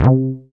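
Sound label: PER PROCU, Procussion, percussion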